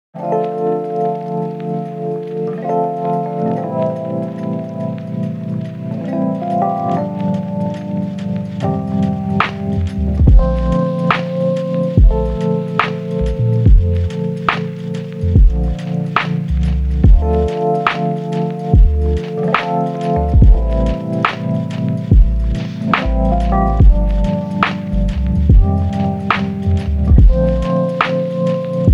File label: lofi hip hop